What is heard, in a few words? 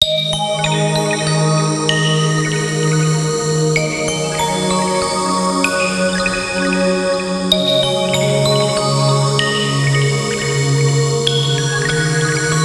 Bubbly soul, ringtones; Lenovo; A319